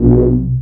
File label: Filtered B, Basses; Zero-G; Total Drum Bass